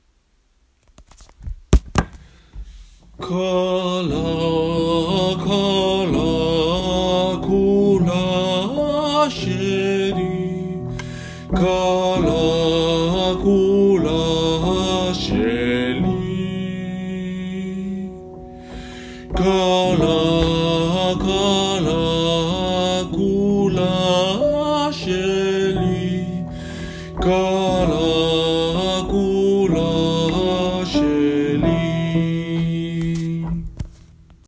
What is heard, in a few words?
Five Hebrew Love Songs II a Tenor